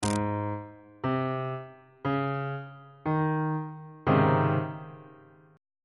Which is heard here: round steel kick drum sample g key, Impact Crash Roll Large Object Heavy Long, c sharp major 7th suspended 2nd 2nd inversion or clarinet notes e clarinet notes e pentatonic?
c sharp major 7th suspended 2nd 2nd inversion